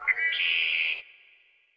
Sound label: Forest bird; ImpGal2; colony